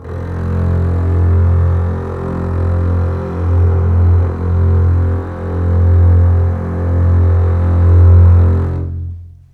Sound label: F1 LEG F, String; Ultimate String Collection